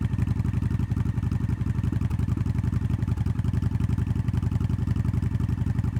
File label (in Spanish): RALENTI LOOP YBR, YBR125